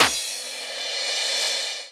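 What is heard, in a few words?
cymbal, Dance Mega Drums; Best Service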